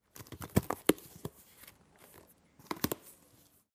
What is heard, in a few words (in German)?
Karton oeffnen